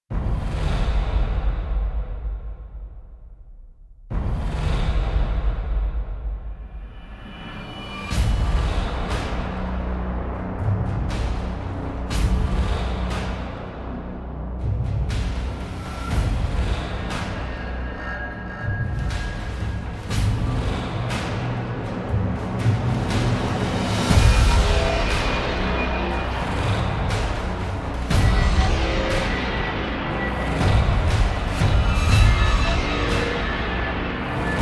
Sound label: Epic Emotional Percussion; Gothic Storm Music